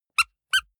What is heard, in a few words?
squeaker squeeze with release soft slow